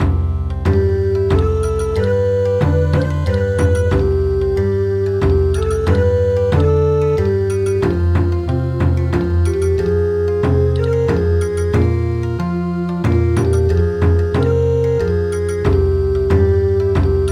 Bon Odori; The Farmer

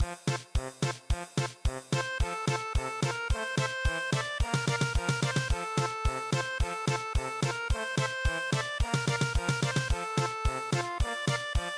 German Polka; singing bird morning

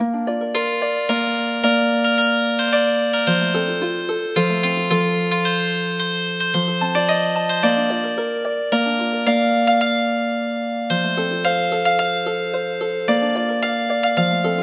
Healin Harp

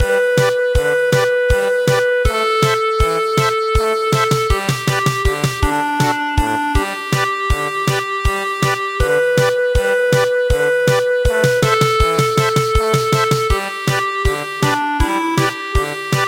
German Polka; My dear mothers child

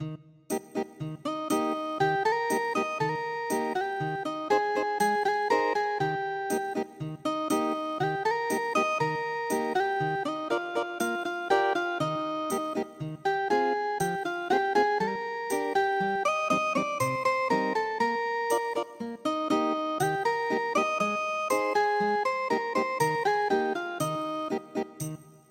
ethnic guitar; Sehnsucht Nach Dem Fruhling

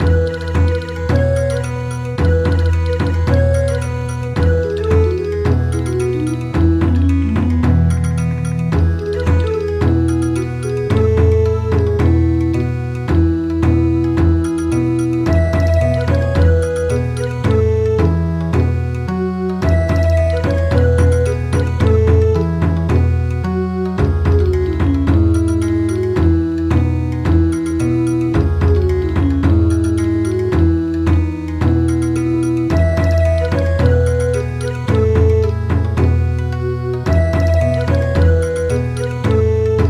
Bon Odori, midi; He is born

